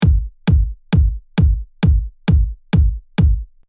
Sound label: kick Loop